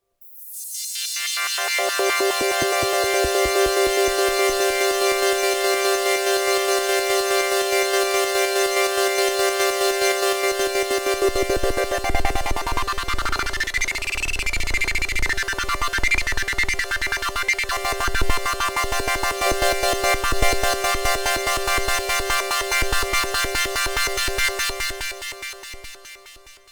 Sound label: Sh-4d Model Chord Descent7